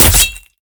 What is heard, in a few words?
Attack Spear